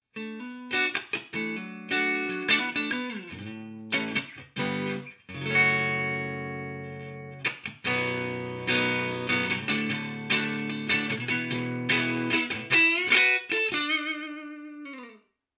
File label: clean